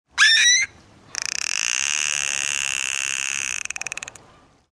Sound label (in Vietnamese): một con cá heo tạo ra âm thanh này trong bể nuôi cá heo